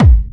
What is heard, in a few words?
electro kick; STYLE KITS; Hip Hop; Boy In A Band Electro Kit